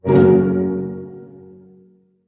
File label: HARP HITS F# MJ, Orchestra; Sound On Sound - Peter Siedleczek's Orchestra